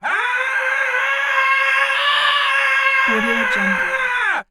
scream; human sounds

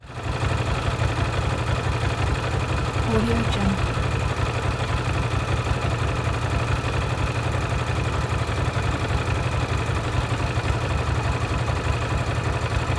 motorcycle cruiser norton dominator ss idle; urban sounds

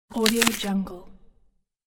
soft crack; game

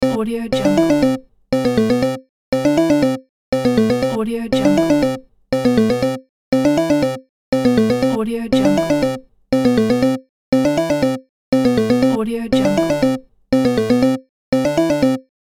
wait load game sequence

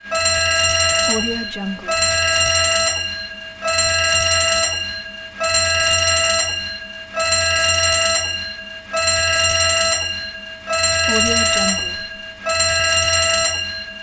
fire alarm; آژیر هشدار آتشنشانی; industrial sounds

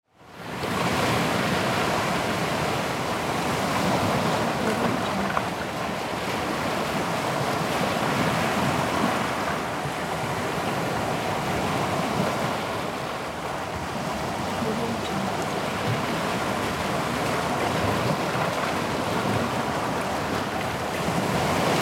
sea waves on a rocky shore; برخورد امواج به ساحل صخره ای

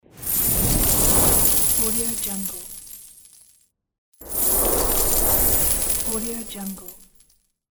coin whoosh; transitions and movement

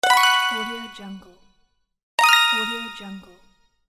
quiz win; game

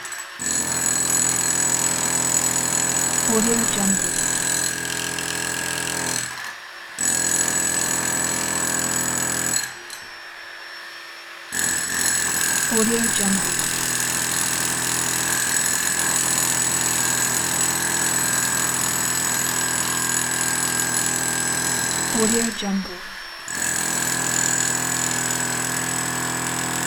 impact drill; urban sounds

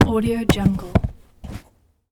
drop remote control small plastic device; cartoon

